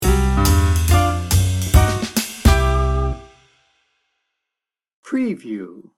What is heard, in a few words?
big band sting bumper